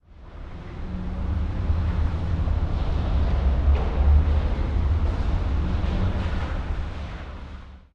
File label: car 1shot, harbor; Source Engine; tf; cp harbor